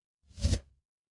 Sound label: ui swipe